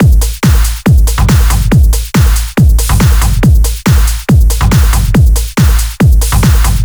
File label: 140 Prog Trance Beat; Phalanx; Vengeance - Essential Clubsounds Vol.5; VEC5 Drumloops; VEC5 Multi Loops 140BPM